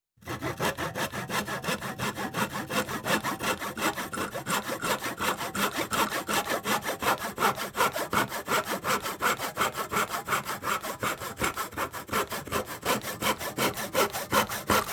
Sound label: Tenon Saw, Small Piece Of Wood; Construction; BBC Sound Effects Library